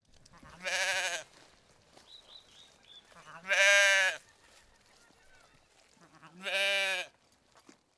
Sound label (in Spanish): OVEJAS 3 BALIDOS EN PRIMER PLANO PUNO